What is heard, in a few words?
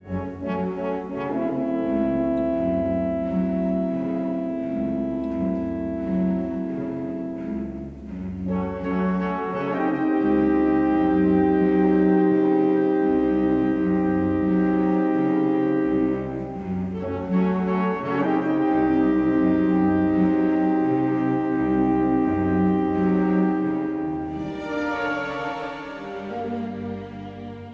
suspense, music